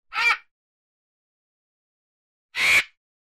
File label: animals